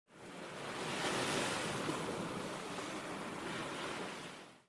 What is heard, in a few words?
fish out